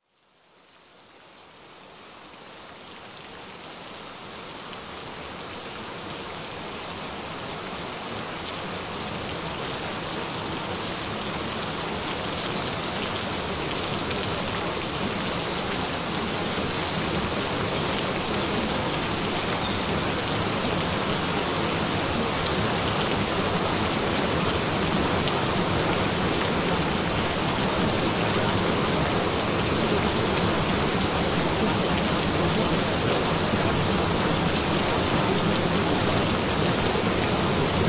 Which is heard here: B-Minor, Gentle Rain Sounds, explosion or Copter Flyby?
Gentle Rain Sounds